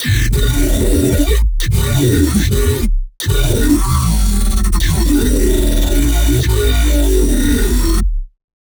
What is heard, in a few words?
Aqua Hits, Dubstep Bass Hits; Ghosthack; Advent Calendar 2017